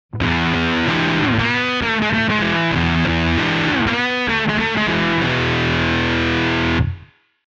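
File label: Fuzz Face, guitar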